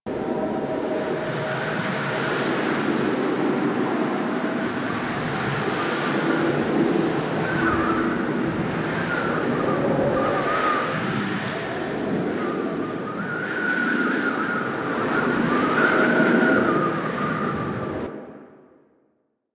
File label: Settlement Open Tunnel Ext Amb